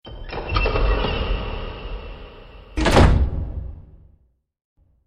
deur dicht

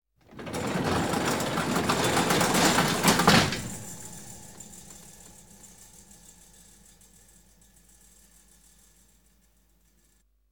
Garagedeur Dicht; KMP Film Video Sound Effects

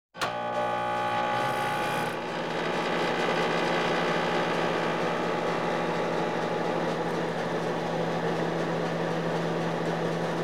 Oven; KMP Film Video Sound Effects

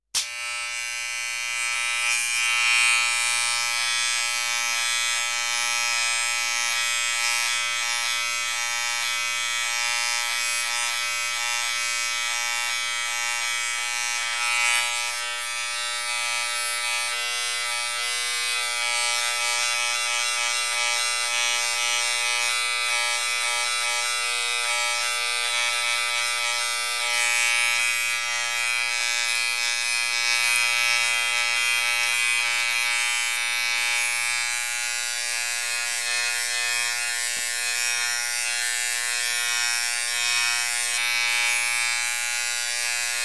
Elektrische Scheerapparaat; KMP Film Video Sound Effects

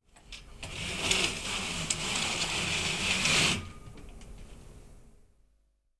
Gordijnen Open; KMP Film Video Sound Effects

